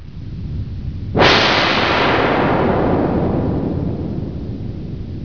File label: explosio; gunshots